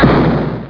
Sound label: bomb